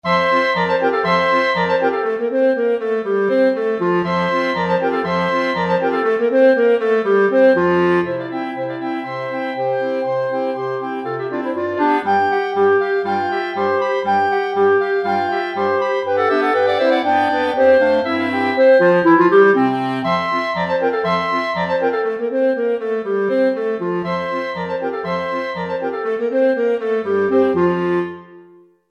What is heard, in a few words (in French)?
Musette